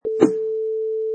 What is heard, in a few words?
Sword Air Swing